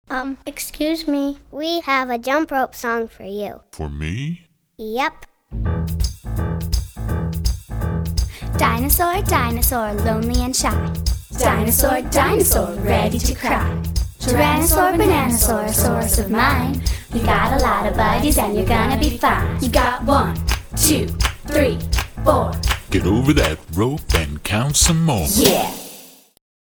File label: Jumpropesong